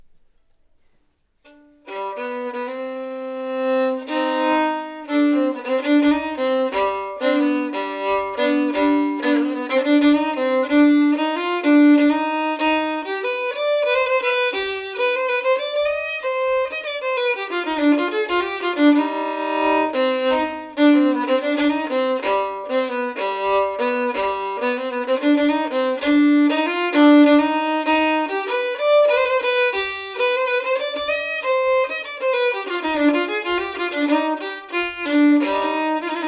stämma fast; svartacksen

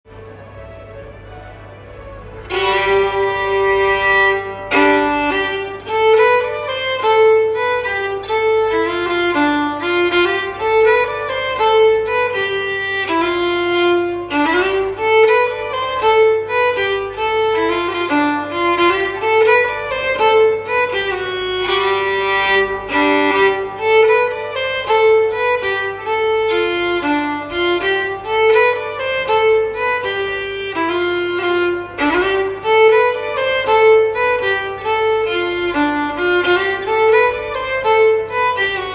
Resårpolskan melodi långsam

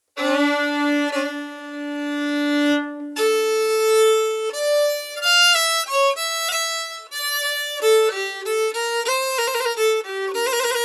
Polska slow; fran Brotorp

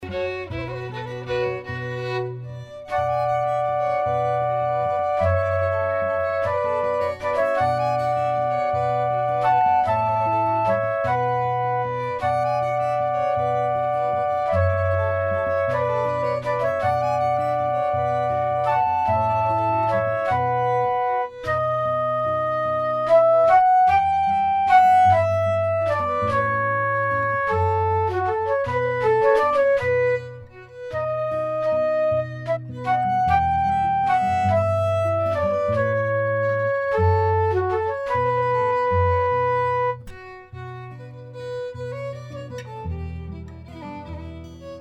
H-moll valsen blåsstämmor

